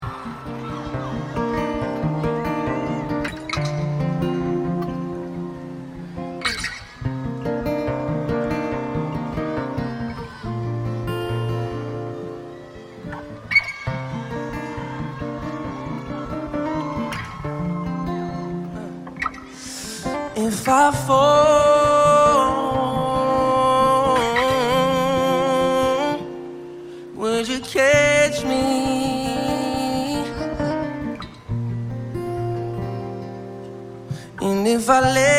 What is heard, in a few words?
Acoustic